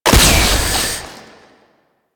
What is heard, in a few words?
Secondary Barrel Fire, Weapons; Warframe; SU Modular Guns